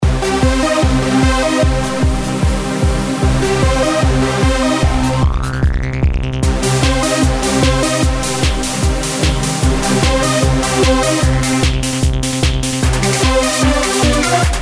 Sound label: Trance